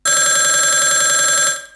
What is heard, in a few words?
oldphone